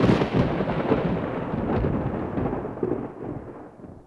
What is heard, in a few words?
Thunder